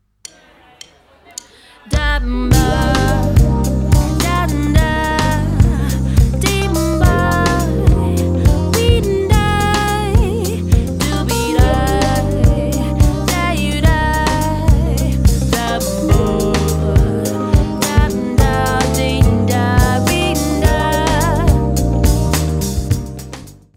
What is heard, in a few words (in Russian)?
Пример для вокалистов опевания Smooth Jazz